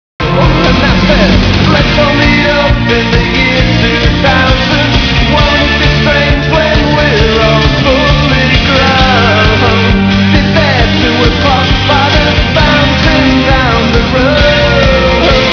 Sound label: disco